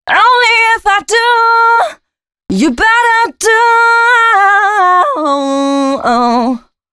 female c# 138, hooklines 136-140 BPM

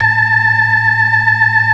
organ; Keith Emerson Signature Series

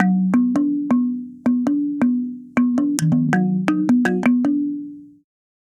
Tongue Drum, African; Sonic Foundry (Sony Creative Software); Continental Drift Aftershocks

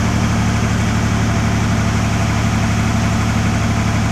DIESEL ENG, Diesel Motor; SFX

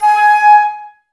Flute, Woodwind

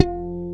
Hrm Flan F 2, GUIT2 AC; Best Service Gigapack 2

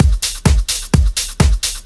Discohouse Loop 128 BPM

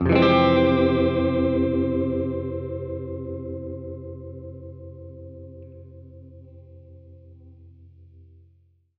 Gretsch Chord Fmin9[maj7], Guitar Chords; undercover samples

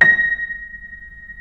A#5 R, Keyboards Acoustic; USB Soundscan; AKAI

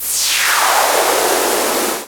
reverse; Techno Trance Essentials; FX & ATMO

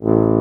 Orchestral Brass

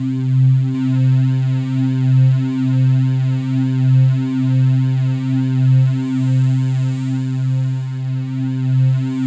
C2, Synth Line

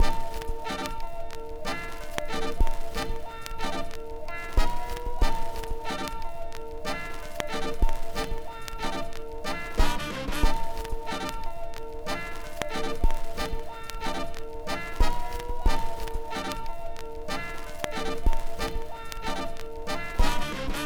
Melody; Let s Do It

